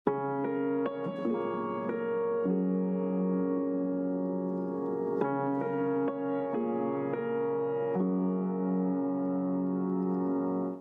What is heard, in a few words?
Piano Sample; Roughrider 2